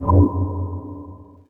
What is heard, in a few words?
confirm static